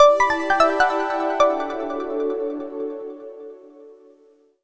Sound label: Clear poweroff; Samsung SGH-i320; Power Sounds